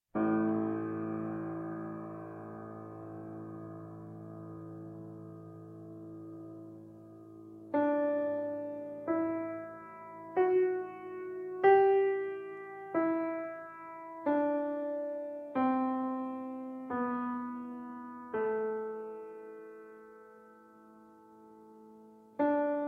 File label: Violin and Piano Lento; Sonata